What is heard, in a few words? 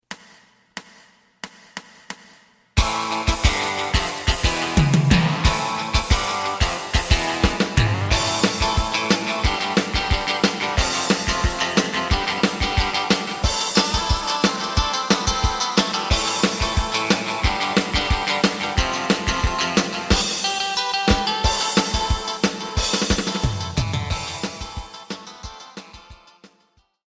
bass